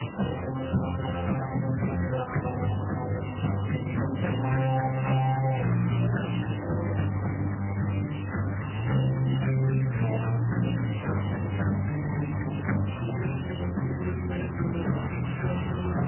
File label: ringtone